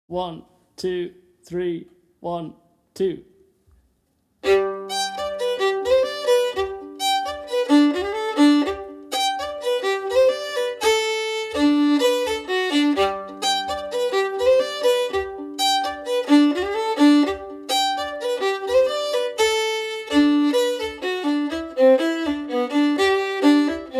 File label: Fiddle Steady